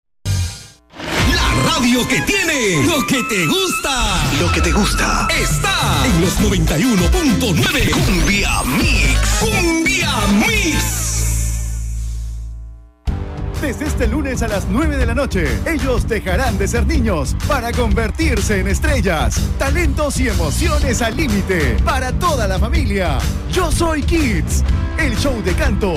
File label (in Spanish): Cumbia Mix comerciales